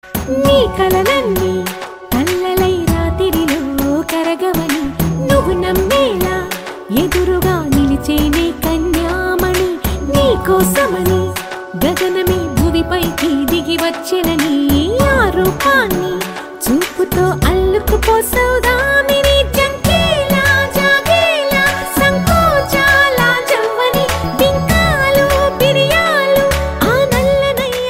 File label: Theme Telugu Ringtone